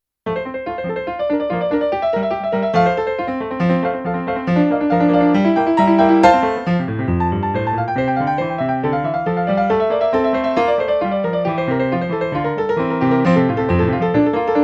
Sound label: bach prelude in f major